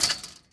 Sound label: hand grab chain fence